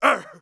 damage, monster; thiefboss1

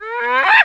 attack, fennec fox

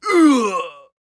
fall; outlaw

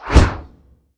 swing; monster; gupae

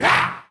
attack, monster; greenfrog soldier

